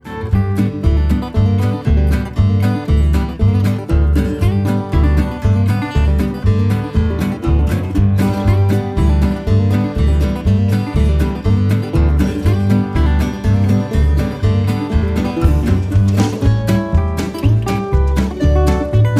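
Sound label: National, guitars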